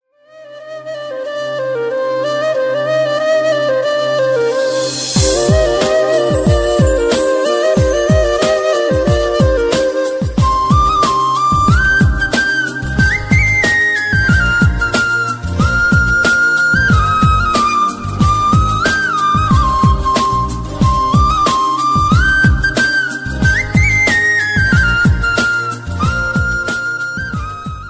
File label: most romantic sad flute, ringtone